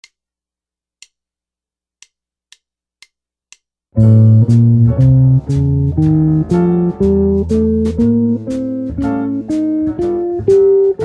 melodic minor modes, guitar

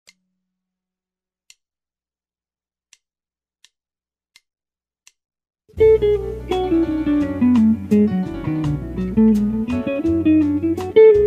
guitar lick

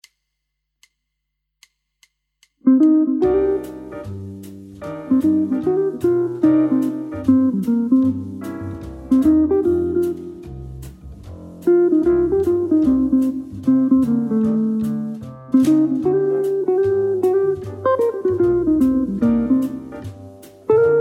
minor blues solo, guitar

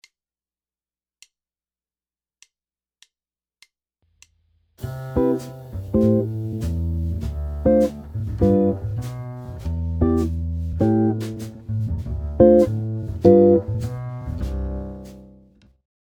jazz chord progression, guitar

